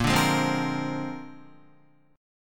Bb 9th Bb down Guitar 4F,4A,5C,5G,5C,6E

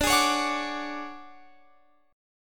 D Major 7th Flat 5th D down Guitar Open F; DM7b5 strummed

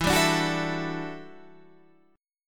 E Minor 11th E down Guitar Standard; Em11 strummed; JGuitar

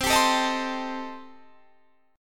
C Minor 6th, chord

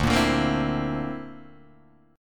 D 7th Suspended 2nd Sharp 5th D, Guitar DADGAD

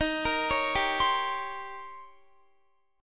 Eb 13th, Guitar; Eb13 arpeggiated; JGuitar

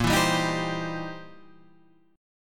C 7th Sharp 9th, A sharp

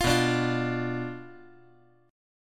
B Diminished B down

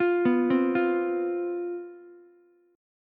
C Major 7th Suspended 4th, down, Ukulele; arpeggiated

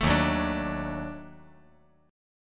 D sharp Minor Major 7th Flat 5th D sharp; strummed

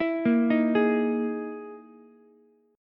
E Major 7th Flat 5th, Ukulele; EM7b5 arpeggiated; JGuitar

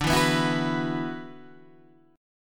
Gb 7th Suspended 4th Sharp 5th D

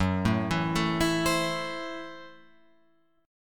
Gb Minor 7th Flat 5th Gb down Guitar Open F; Gbm7b5 arpeggiated; JGuitar

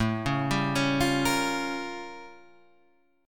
A Augmented 7th Flat 9th A; arpeggiated; 1,3,sharp5,b7,b9; JGuitar

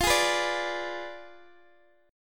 F Diminished 7th Guitar DADGAD

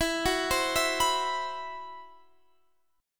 C Major 7th Flat 5th E

